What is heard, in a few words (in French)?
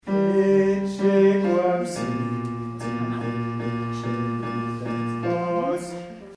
basse; mesures 1-4; ecce quam sit